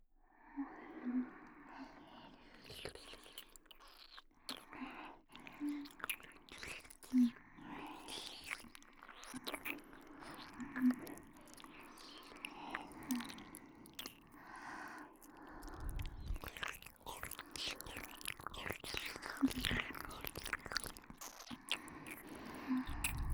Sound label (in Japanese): 耳舐め責め; ルビーのみ